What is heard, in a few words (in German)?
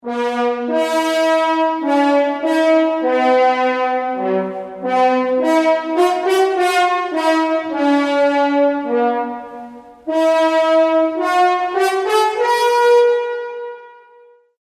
posaune